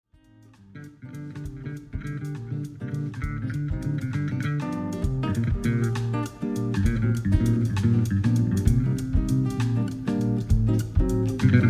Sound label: jazz